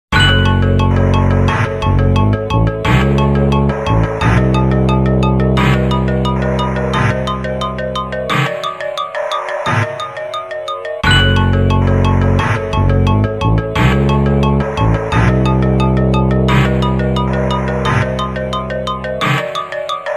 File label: heartbeat caverns